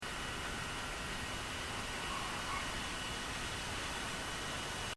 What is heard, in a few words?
Dog Bark; The sound of a small dog barking in the Jimmy Durante suite on the 5th level of the Del Mar